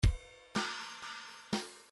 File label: bdf midi file grooves rock i rock i